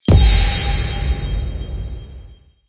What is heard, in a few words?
small Win; gonzos gold